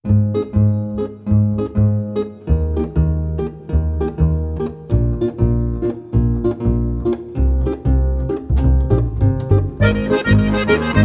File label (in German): Klingelton; gschrems